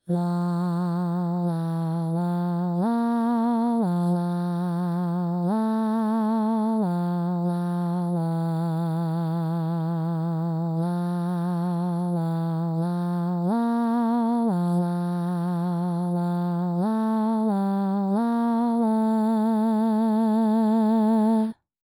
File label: Tenor